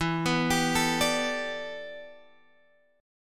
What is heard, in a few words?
E Minor 11th guitar chord, Open G